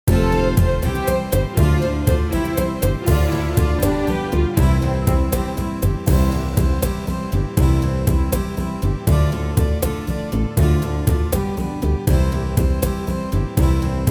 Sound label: backing, folk; bassoon; the irish washerwoman